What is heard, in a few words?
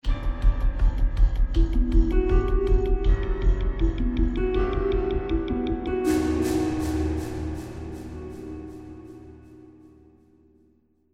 territory stinger; inFamous; PS3